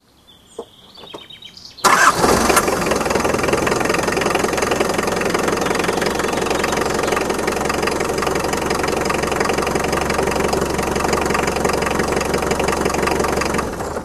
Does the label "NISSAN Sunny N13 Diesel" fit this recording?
Yes